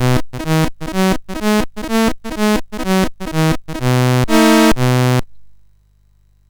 MOS6581R2, GAMES; Sammy Lightfoot

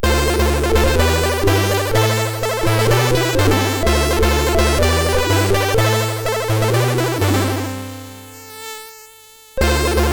sid, MOS6581R3

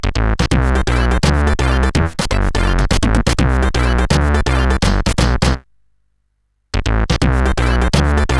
Su-Sweet, CSG8580R5; sid

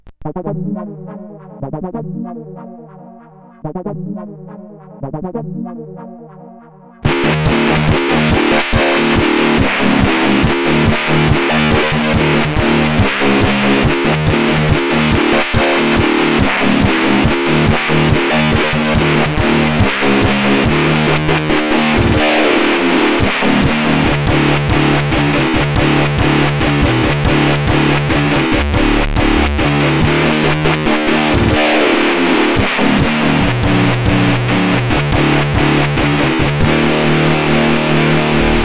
Heavyweight, CSG8580

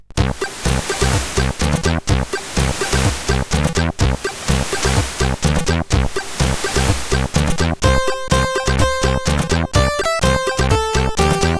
CSG8580; Beach

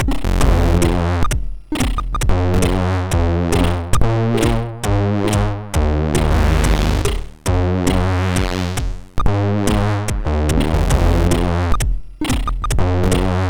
Intro, MOS6581; Pocket Money Intro

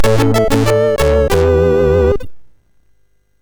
Jingles, sid MOS6581R2

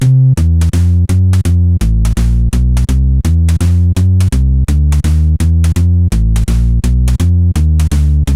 Blues, CSG8580R5; Spike Super Blues Experience; hvsc

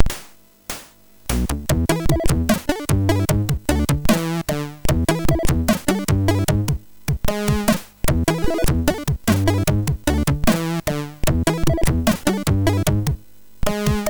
Short Jazz, MOS6581R4

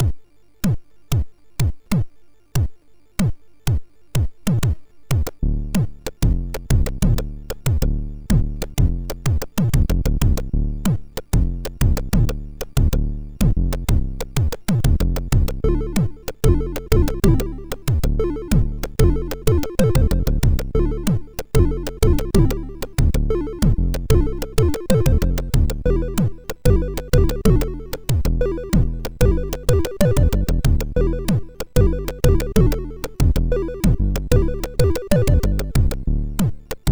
MOS6581R4 sid; Flying Cavemans 2

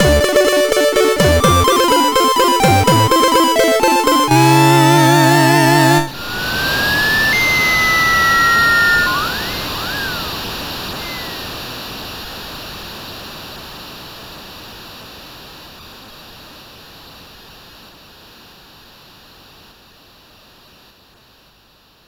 Fanfare, CSG8580R5; Fanfare of Heroes

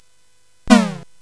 Dark Castle, CSG8580R5

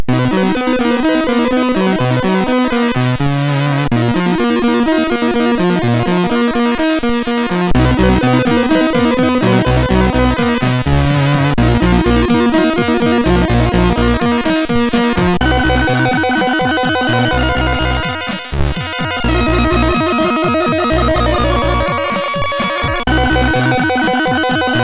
Slow Metal sid MOS6581R3; Digger; hvsc

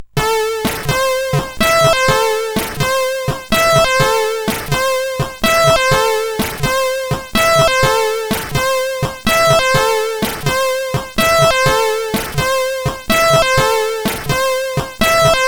Jingles, CSG8580R5

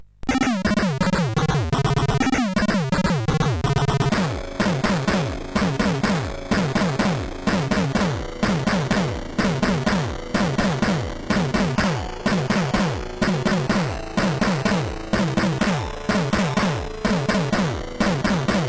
Hard Rock n Riffs, CSG8580R5; Selles Ward

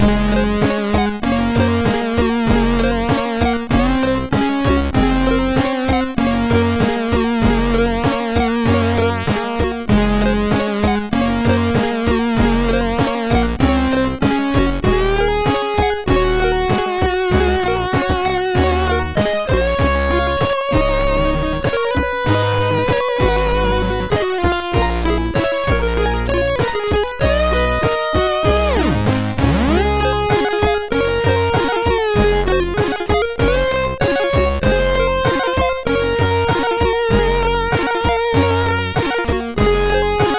Dial Tone, sid, MOS6581R3

